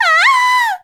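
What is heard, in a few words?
woman scream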